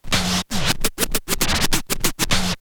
scratch kit, vinyl; Masterbits; Vinyl Attack

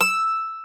mandolin, Stereo Mandolin; E-MU Producer Series Vol. 2; More Studio Essentials; Composer

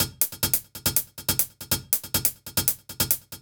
Hh Loop, Drum Loops 140bpm; Zero-G Trance Inducer

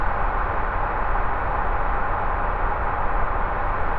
InfiniVerb Noise, sparse soundscape Loops

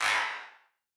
Wider Clap; stereo toolkit samples

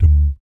BASS DUM; Spectrasonics; Vocal Planet; Jazz FX

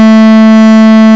ELEK ORG; KEYBOARD; CMI Sound Library Rev 1.3

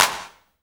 CLAPS HOU; Best Service Dance Mega Drums

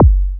BD, Dance Drums; Best Service ProSamples vol.15; AKAI

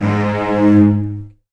Virtuoso Strings, TRM FX

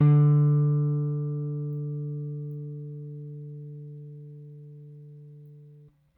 Rick Thumb, Rickenbacker Bass; Airwindows

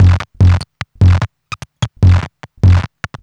Modular Loop, Modular Percussion

